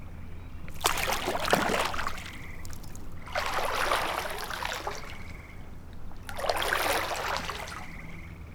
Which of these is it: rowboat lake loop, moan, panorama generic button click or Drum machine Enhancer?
rowboat lake loop